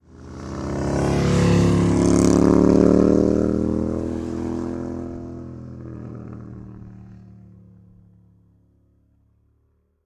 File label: motorcycle pass by